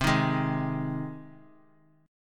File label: C Minor Sharp 5th, Cm#5 chord; strummed; JGuitar